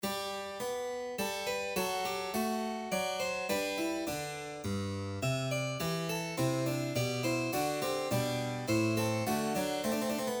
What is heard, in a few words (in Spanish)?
Minueto en Sol Mayor